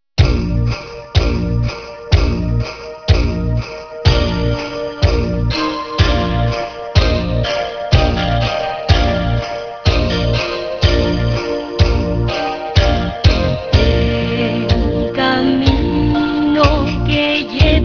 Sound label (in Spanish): tambor